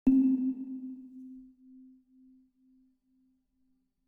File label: ambient